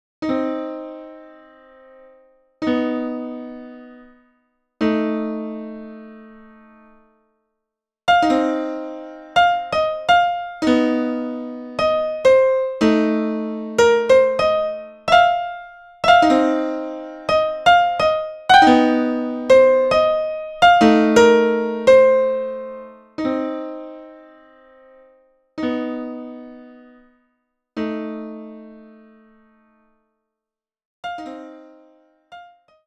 Piano; SimCity 2000

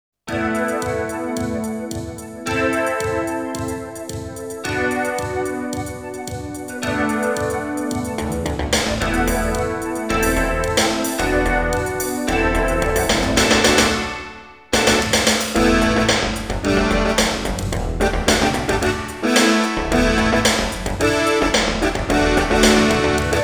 Synthesizer Version; Roppongi; Super Famicom Magazine Volume 17

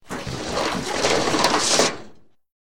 Open Attic

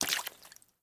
step Water

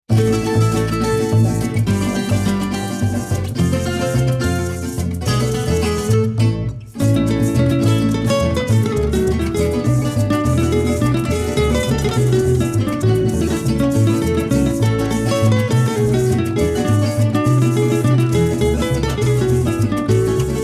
Music Vehicle Radio Events

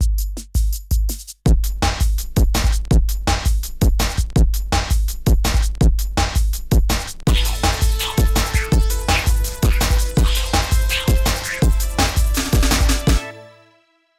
Stinger; Miner 49er Level 2

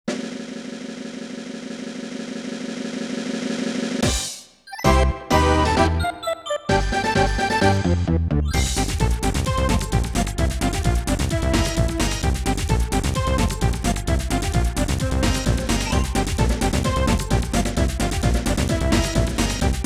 Victory; bomberman 64 original soundtrack

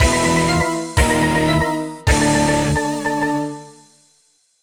Lose; mario vs. donkey kong minis march again; nds; Mentone

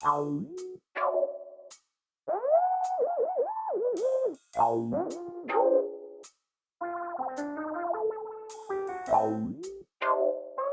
BGM Lobby Versus Speaker

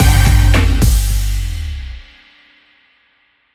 Retry Jingle; Friday Night Funkin' SingStar Challenge OST mod Windows gamerip 2022

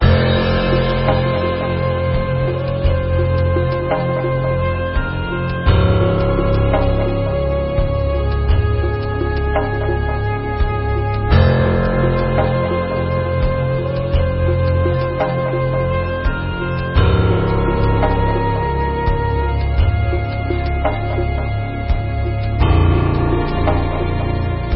background egypt; heroes saga android

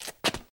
Rustle Body Movement Crouch

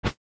Move Ach Sound; bloodungeon gamerip

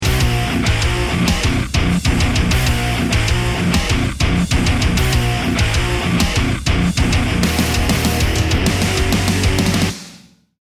start action

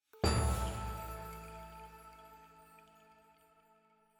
item Jingle Bell chime + Perc A

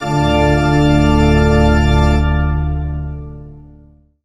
Stinger Organ; Sam & Max Season Two: Beyond Space and Time

